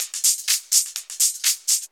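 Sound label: drum loop 125, hh+filterloops; PS-28 Vocal House Samples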